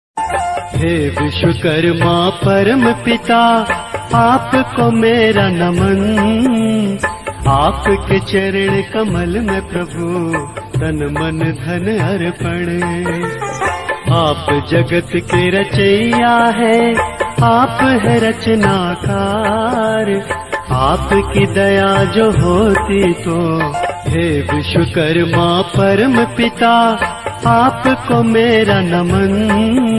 ringtone bhakti ringtone hindi ringtone

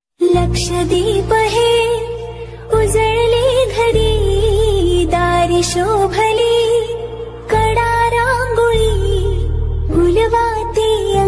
new marathi ringtone diwali ringtone

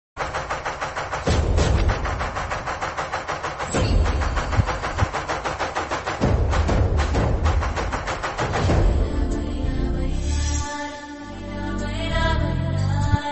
emotional bgm; dhammu movie

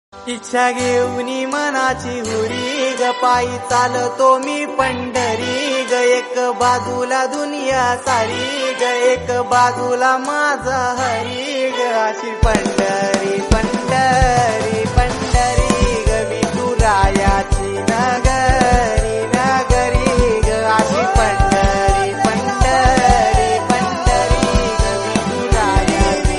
marathi ringtone; Vitthal

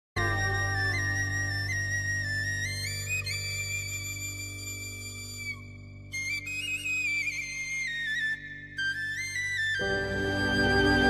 flute ringtone; dhadak movies ringtone

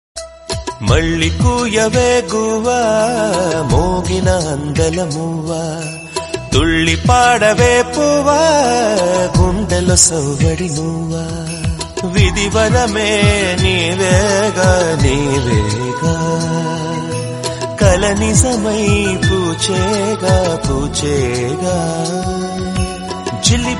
love ringtone melody ringtone, telugu ringtones; itlu sravani subramanyam

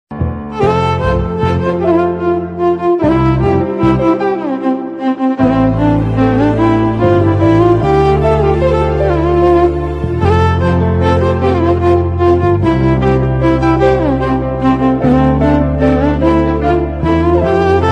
melody bgm ringtone

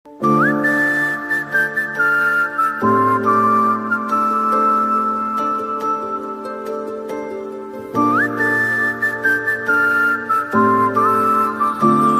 instrumental gujarati ringtone

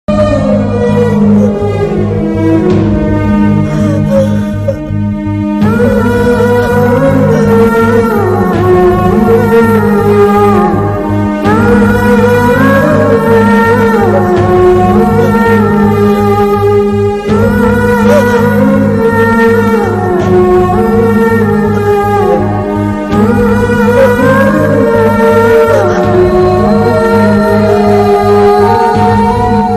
emotional bgm soundtrack; soggade chinni nayana

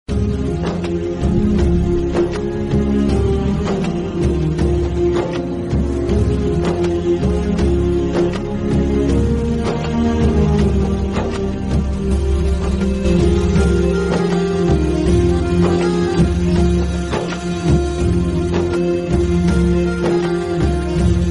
love bgm; govindhudu andarivadele; ram charan

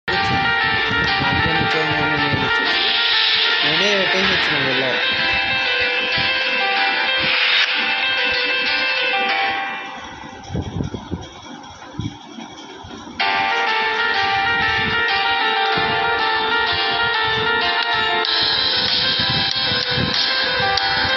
piano bgm full ringtone; nenu local

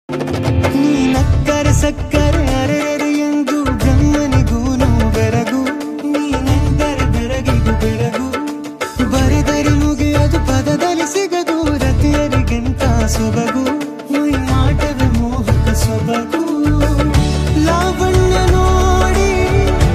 love bgm ringtone

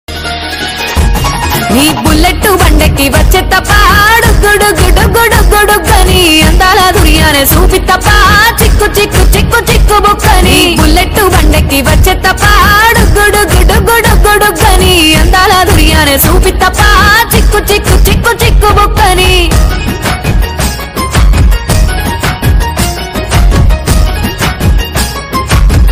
folk song ringtone telugu folk song; royal enfield ringtones

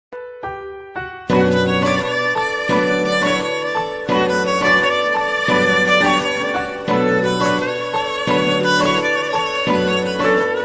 emotional bgm instrumental ringtone

